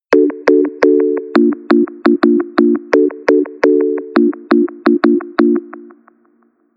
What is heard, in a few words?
notification call